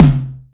mid urban tom drum c key, Royality free tom sound tuned to the C note. Loudest frequency: 362Hz